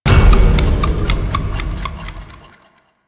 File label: Boost Timestop Start; Warframe; Nineteeen Ninety Nine; PvPvE